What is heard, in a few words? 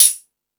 Space Drums, Drum Machines